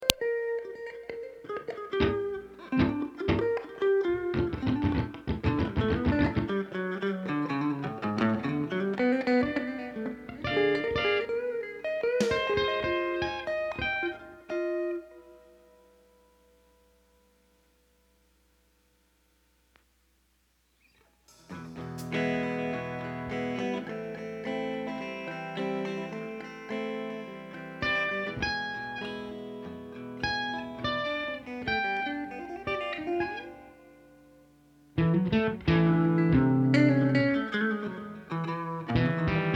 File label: Ambient Guitar Mix